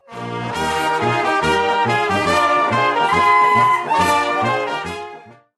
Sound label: Coolest Sms Tone